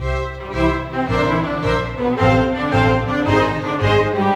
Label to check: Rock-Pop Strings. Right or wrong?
Right